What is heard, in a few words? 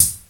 Prominent Pedal Hi-Hat One Shot D Key, closed hi hat sample tuned to the D note; one shots; hat samples